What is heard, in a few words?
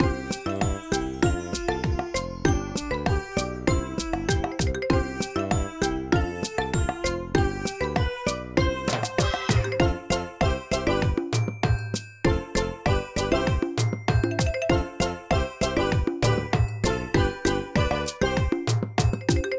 ui friendship mission